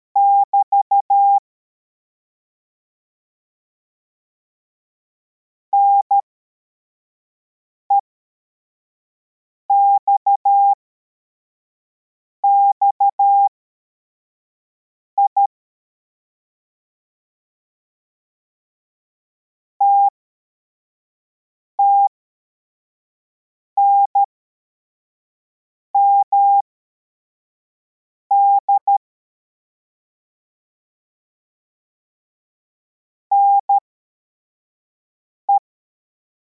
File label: cw, morse